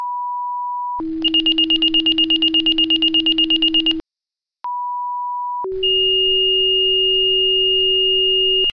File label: siren; SIREN160